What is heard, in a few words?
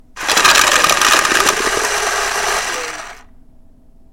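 Card Shuffler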